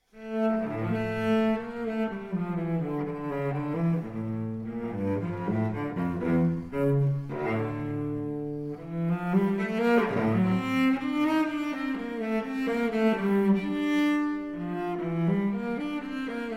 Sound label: Cello Suite in D Minor, Allemande; Cello Suite No. 2; BWV 1008 II.